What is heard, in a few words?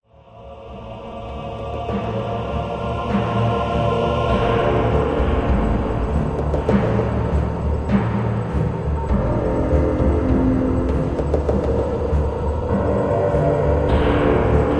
Church tune